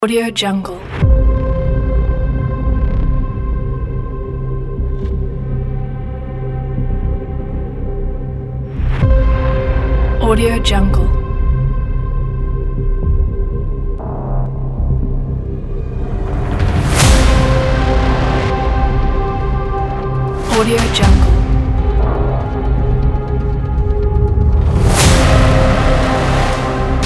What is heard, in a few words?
massive epic cinematic dramatic trailer